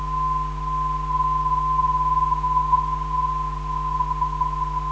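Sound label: non-directional beacon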